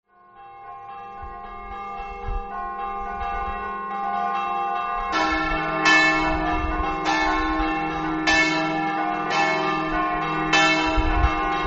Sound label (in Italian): campane; lauzzana